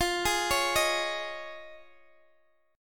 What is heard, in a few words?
F Minor 7th Sharp 5th, Guitar, Open D